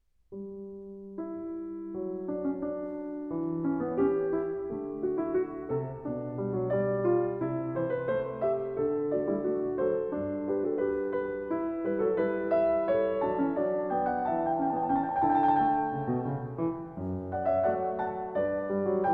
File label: Goldberg Variations, BWV 988 - Var. 22 a 1 Clav. Alla breve